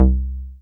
303, TB-303 BASS

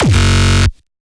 Frenchcore Kick

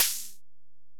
HiHat, Alesis DM-PRO

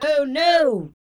VOX, VOCALS; Ueberschall; Jam Box

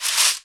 SHEKERE, Drums&Percussion; E-MU Producer Series Vol. 7 – Old World Instruments

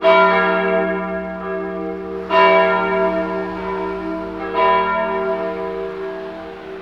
MORE BELLS, Cathedral Organ; ILIO; Propeller Islands

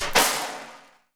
ocean drum

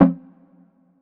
stab spaced, stabs

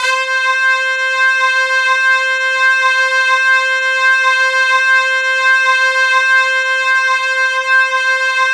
C5, Synth Line; 90 s Sample CDs; NiceBeats; SynthLine VOL-24

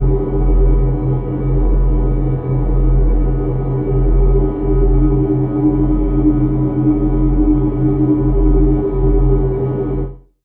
drone hollow F, drone pad texture

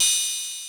kit, Yamaha Motif-ES8

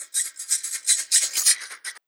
LOOPS

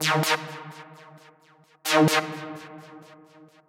Synths, Underground UK House & Electro

